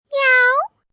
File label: Cat Meow, 1 child, imitates a cat meow; Exclamation Sound Effects; Imitation; kid, human voice